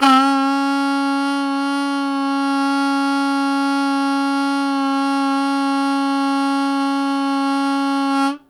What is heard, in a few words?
Loud Attack Sustain C#2, Harmonica; Zero G - Harmonica